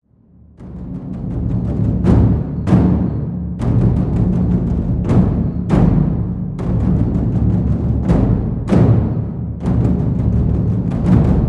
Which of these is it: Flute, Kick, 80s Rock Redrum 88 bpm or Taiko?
Taiko